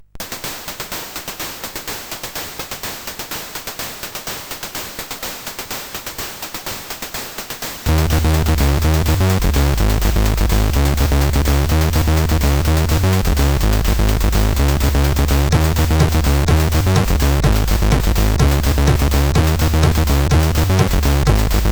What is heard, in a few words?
Housemix sid CSG8580R5